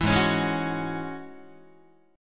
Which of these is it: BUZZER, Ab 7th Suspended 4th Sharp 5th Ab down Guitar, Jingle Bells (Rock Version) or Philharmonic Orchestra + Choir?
Ab 7th Suspended 4th Sharp 5th Ab down Guitar